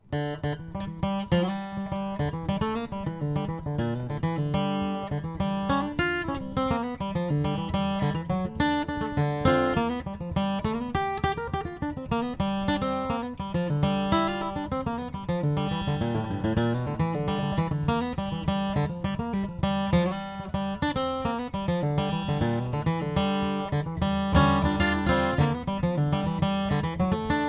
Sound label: Gibson J-35; Chris Bozung; 1939